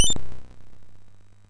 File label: sid MOS6581R4, GAMES; Chinese Juggler